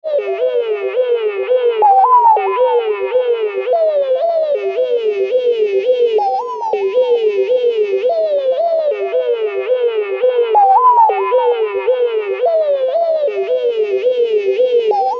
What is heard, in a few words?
loop 110